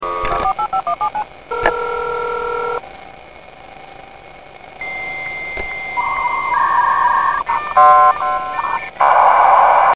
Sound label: Sound of a 56k modem